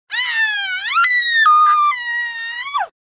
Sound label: remorseless scream